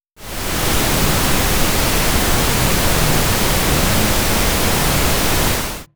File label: Multiple bass revs w pink noise